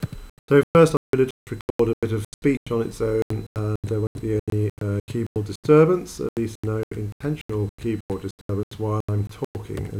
voice est muted voice only aaaaaaaaahhhhhhhhhllllll